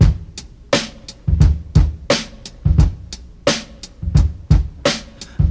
87 bpm drum groove C# key, Free breakbeat sample - kick tuned to the C# note. Loudest frequency: 935Hz